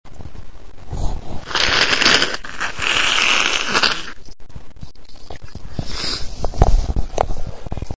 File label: blowing nose ew, female